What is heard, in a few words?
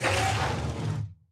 shoot down voice